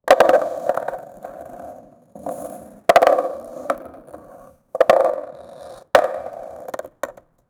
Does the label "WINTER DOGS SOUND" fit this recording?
No